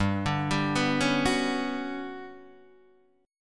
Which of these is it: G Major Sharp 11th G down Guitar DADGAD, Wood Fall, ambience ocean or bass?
G Major Sharp 11th G down Guitar DADGAD